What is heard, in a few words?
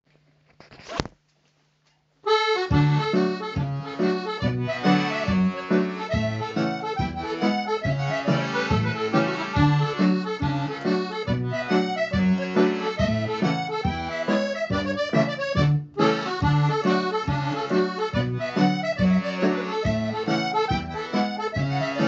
Hornpipes; CLRG